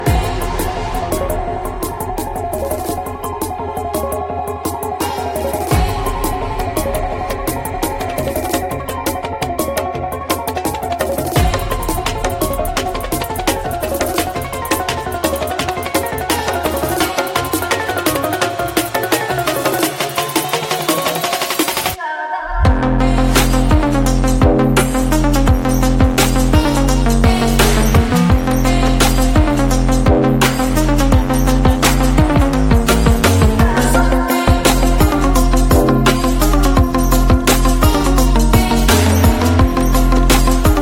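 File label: VILLAIN background music ringtone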